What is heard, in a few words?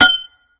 Metal Pipe Hit; Angry Birds